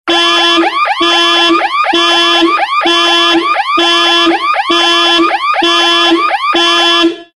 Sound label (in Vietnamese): Tieng coi bao dong xe oto